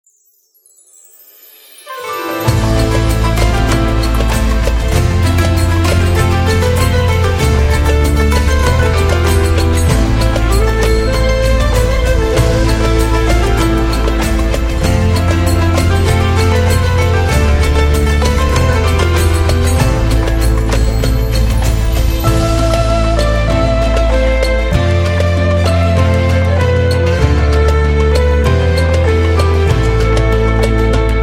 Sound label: Arabic Sad